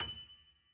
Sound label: key